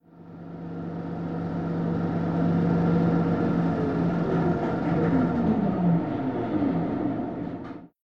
Elevator Moving Out

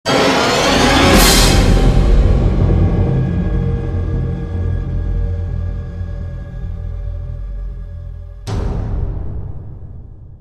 SHOCK; F.E.A.R. Perseus Mandate

